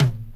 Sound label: KIT, Dance Mega Drums; Best Service